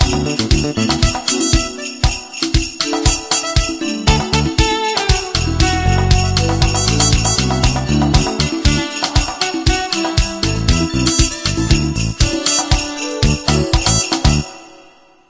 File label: funky